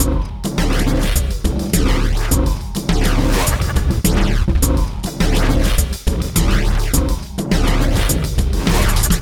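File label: Loop, beats; Sonic Foundry (Sony Creative Software); Esoterik Beatz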